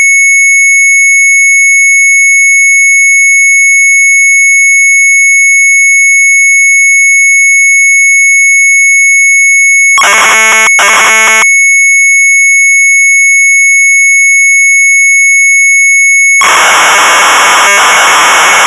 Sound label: Sharp MZ800 Tape; retromelsarchive